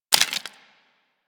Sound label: Bullet Impact Debris Wood